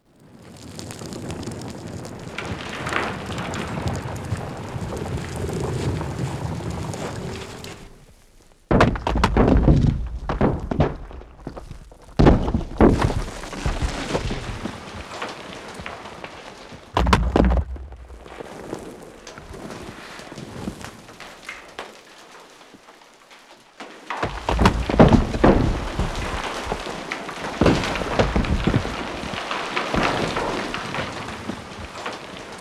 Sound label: Landslide With Rocks; Natural Elements